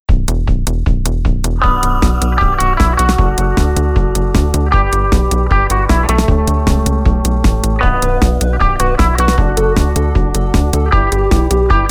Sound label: new wave jingle